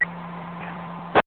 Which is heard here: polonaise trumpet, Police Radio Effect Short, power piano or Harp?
Police Radio Effect Short